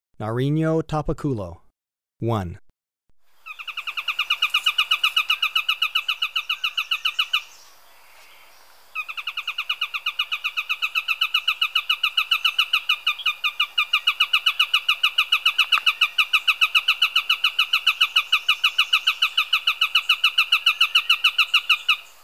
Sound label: Narino Tapaculo, Birds of Ecuador; S. America